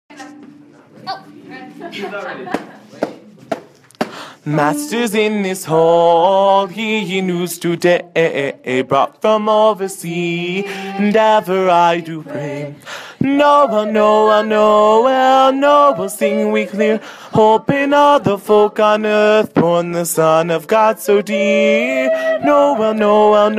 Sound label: tenor; masters in this hall